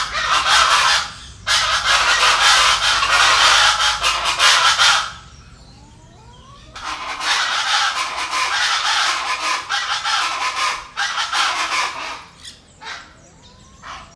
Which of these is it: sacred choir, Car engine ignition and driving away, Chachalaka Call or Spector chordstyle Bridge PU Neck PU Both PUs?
Chachalaka Call